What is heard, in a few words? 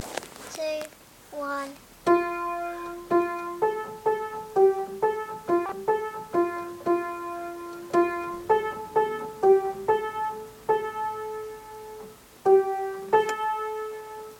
piano practice